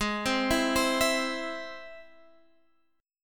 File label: E Augmented G sharp, guitar